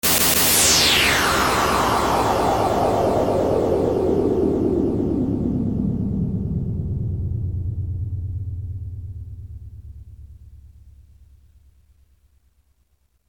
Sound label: encount